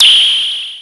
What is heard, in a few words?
Fairlight CMI III Noise Slap, Drum Machines